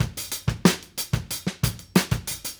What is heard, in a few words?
Call And Response Drums A, 70s Analog Funk; Sonic Foundry (Sony Creative Software); What it Is!